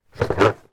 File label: leisure board game game box close; rummikub